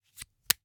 Hwatu, card game, hard plastic, small, clatter, pull, slap, throw down, single card, strong; Eiravaein Works; Vaeyan IV